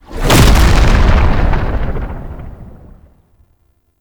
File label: Undercroft UI Portal; Warframe; Duviri